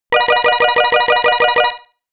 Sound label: winner, lucky wheel